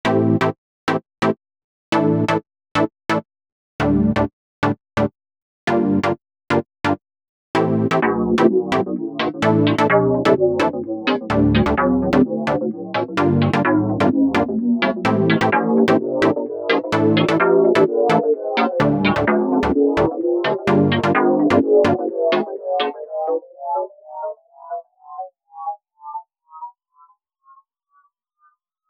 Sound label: Underwater Shifts; Multitude